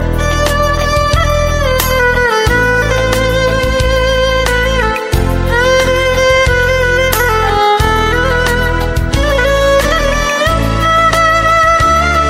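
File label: Instrumental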